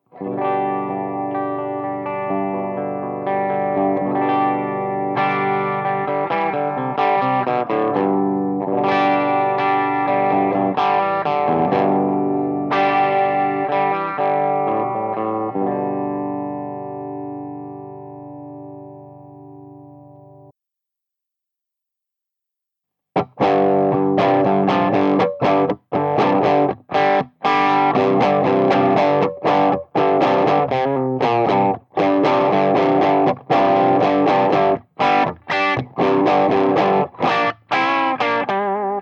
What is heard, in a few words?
G12H Les Paul